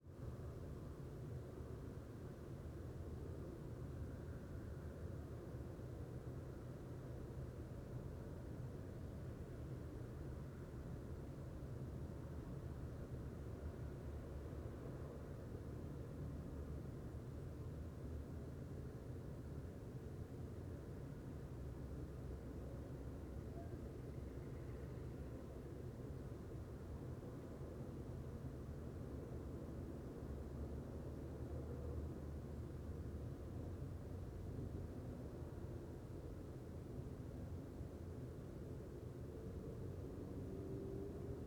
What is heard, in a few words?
quiet night countryside distant traffic; aXLsound